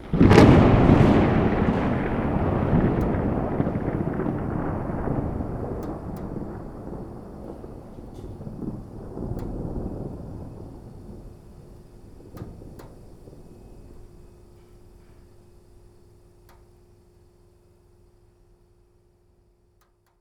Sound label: heavy thunder; 99Sounds; Rain And Thunder